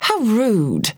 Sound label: Taking Damage; Paladins; Churchill